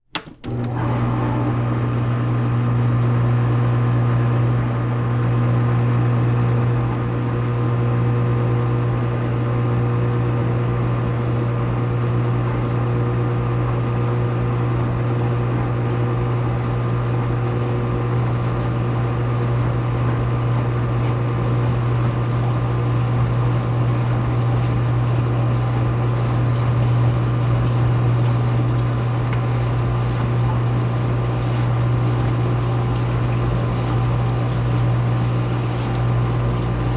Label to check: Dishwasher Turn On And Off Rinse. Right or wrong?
Right